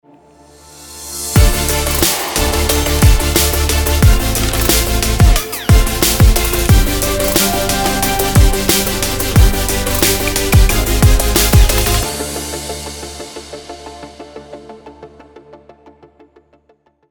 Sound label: Future Bass Sport